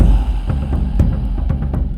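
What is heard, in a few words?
Music Shield; from dust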